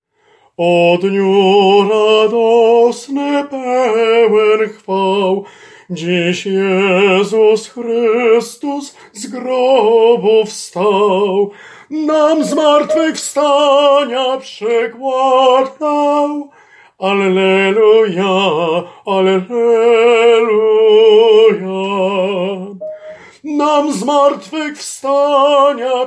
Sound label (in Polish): O dniu radosny SOPRAN